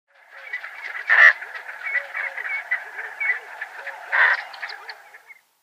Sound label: Sacred Ibis flightcall